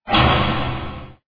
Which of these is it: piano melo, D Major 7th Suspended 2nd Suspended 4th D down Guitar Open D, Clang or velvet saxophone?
Clang